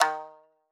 marimba shamisen

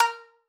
marimba shamisen; ゆめ2っき

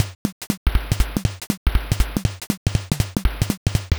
drums; ゆめ2っき

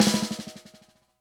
marimba drum; ゆめ2っき

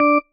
marimba tissue; ゆめ2っき

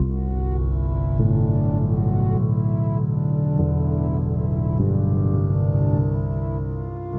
bgm, Music; ゆめ2っき

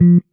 marimba; ゆめ2っき

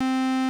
Beep Short

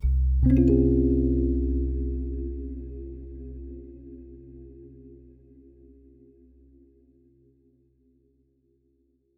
pseudo pulsar; ゆめ2っき

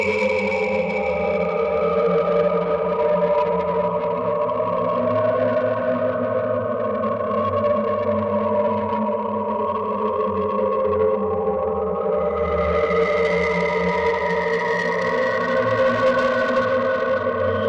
Eerie; ゆめ2っき

